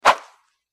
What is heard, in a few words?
Thick Cable Swishes